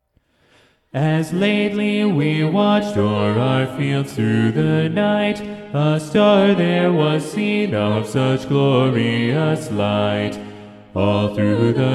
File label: SATB Bass predominant; Bass 1; choirs